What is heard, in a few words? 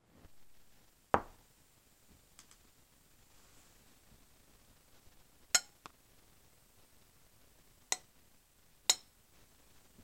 Clacks; ludum dare 46